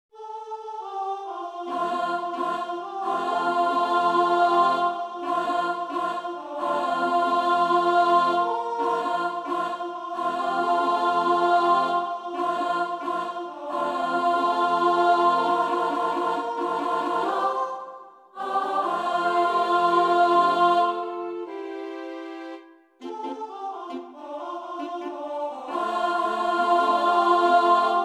If you can walk you can dance Sop

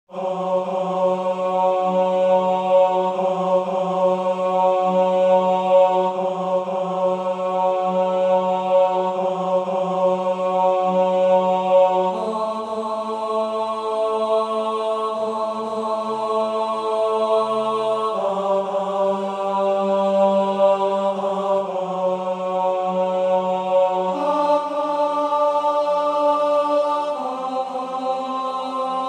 Tenor

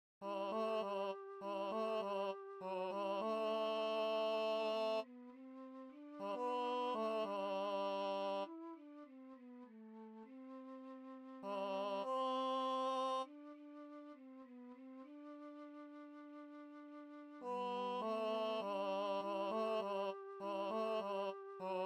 Chorus Tenor

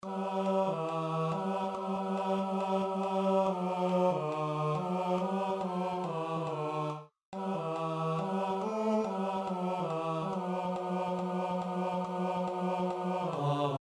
Bottom Harmony

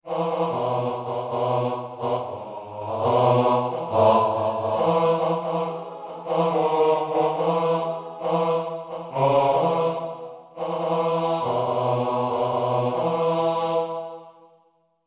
Verse Bass

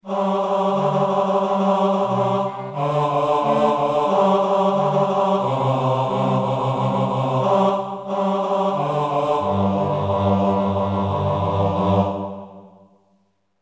choir Bass

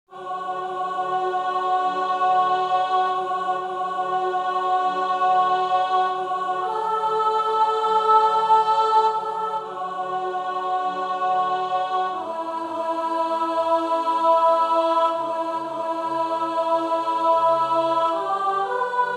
Soprano

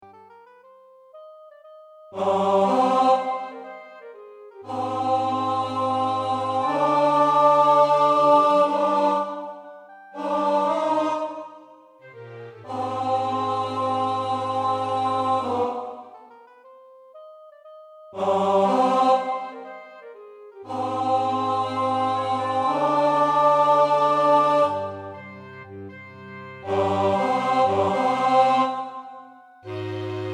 Tenor

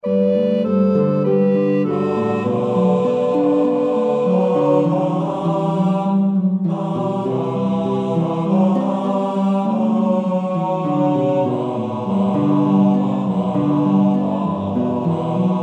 Tenor

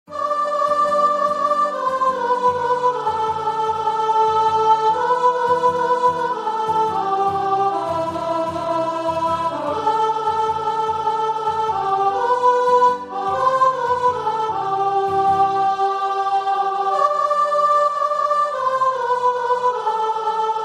Tune

